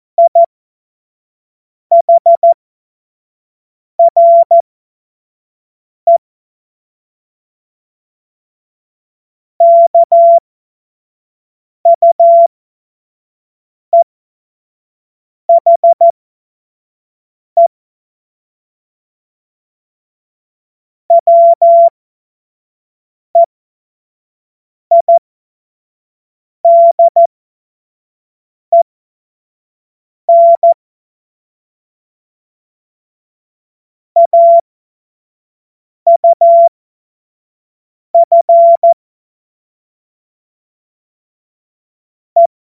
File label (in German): Witz, cw morsen; Tempo 25 F70